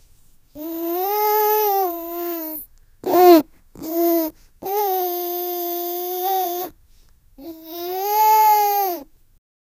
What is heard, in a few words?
baby smile